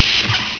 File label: DOORS